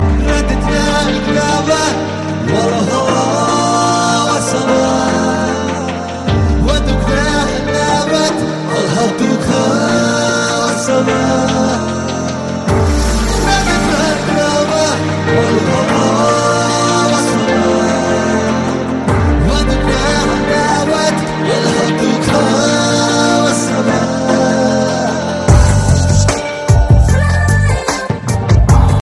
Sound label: fashion arabic ringtone